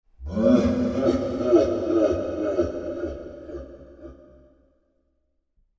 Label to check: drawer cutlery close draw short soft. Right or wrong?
Wrong